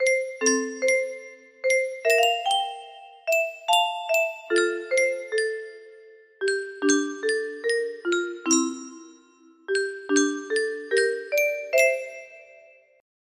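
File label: music box melody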